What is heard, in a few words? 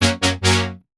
Synth Shot F# Future; Trap and Dubstep; Synth One Shots; Ghosthack; Free Trap and Dubstep Samples 2018